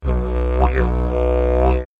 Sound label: Ringertone